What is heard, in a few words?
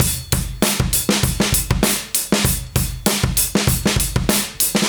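98 bpm, beat loops; Zero-G; Monster Beats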